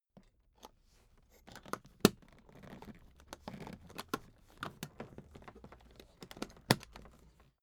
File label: suitcase handling